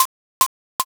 rap noise

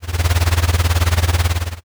helicopter

